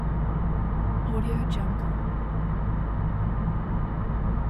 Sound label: luxury car boot interior driving loop